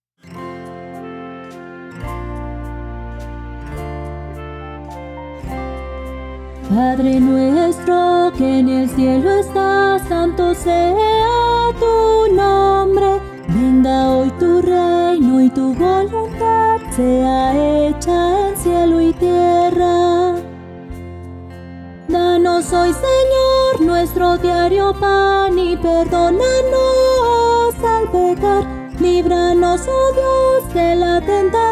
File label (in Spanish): Padrenuestro